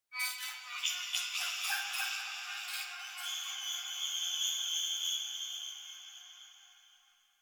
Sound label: Texture Interior Small; Sentient Lair; Ambience; Warframe